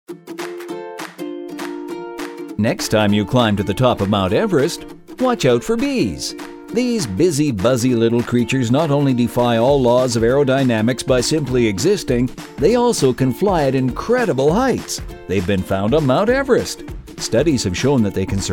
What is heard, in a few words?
phone on hold; MOH